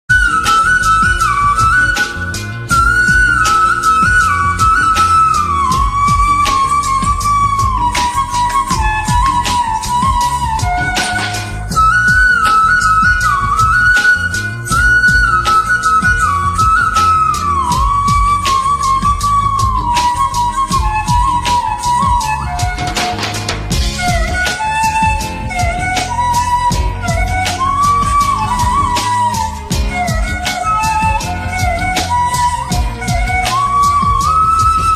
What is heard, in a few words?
Varsham Instrumental